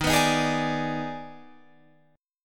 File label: D sharp Major 7th Suspended 4th Sharp 5th D sharp Guitar Drop D, chord; 13,14,13,13,15,16; chordshape